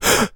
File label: human male gasp shock